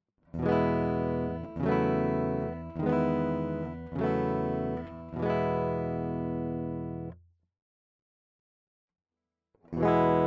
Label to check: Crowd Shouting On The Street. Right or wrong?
Wrong